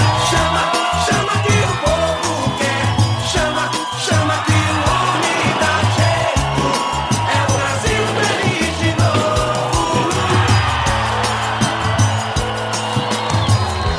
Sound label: ringtone